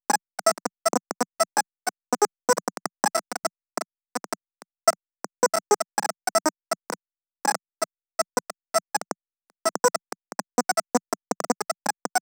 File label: glitchy melody